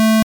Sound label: Square wave 220 hz